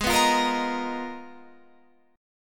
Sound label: G sharp Minor 6th Add 9th G sharp Guitar Open F, chord; G#m6add9 strummed; 20,18,17,17,18,17